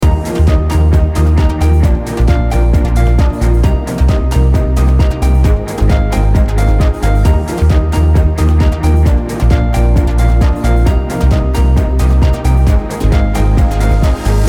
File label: Uplifting Loop